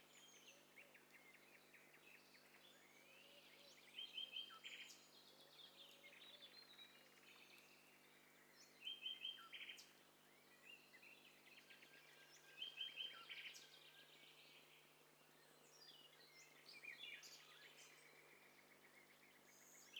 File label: STEREO BIRD COCKTAIL PARTY